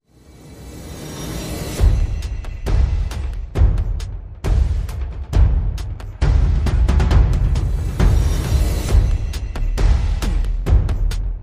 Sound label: Perc and FX Only